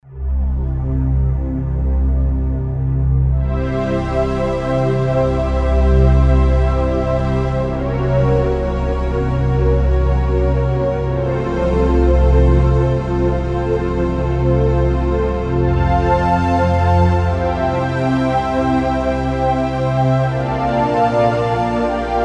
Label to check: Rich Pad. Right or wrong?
Right